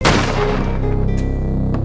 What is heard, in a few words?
death; singe